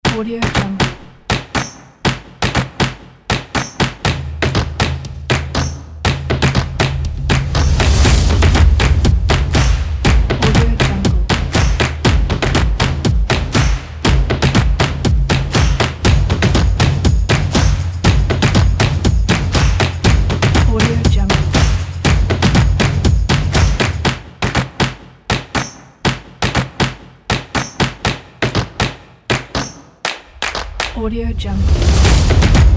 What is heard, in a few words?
happy cheery percussion jingle; vitality